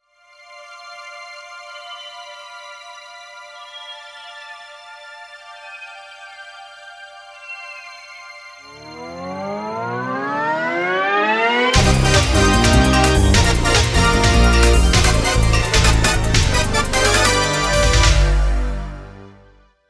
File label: Stage Start; Mega Man X3; Saturn; Crush Crawfish